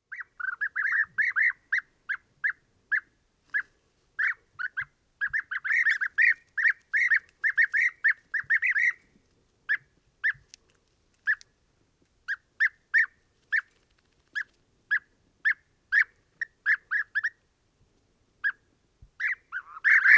Guepier d Europe, les oiseaux

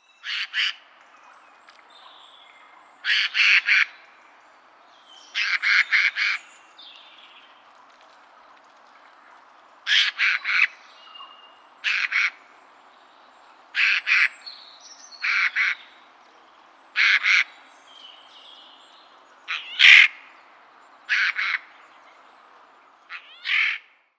Geai des chenes, les oiseaux

